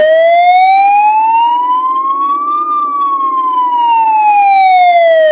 norway wail, siren; gmod; svmod

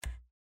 switch tab; gmod; paris

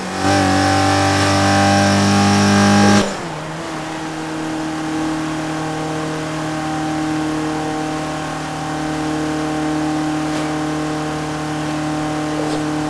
fourth cruise, vehicles; gmod; tdmcars; golf2

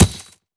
frag physics bounce grass hard; gmod; viper

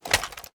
ar reload fast end; gmod; viper

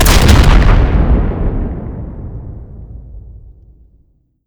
rocket expl body; gmod; viper

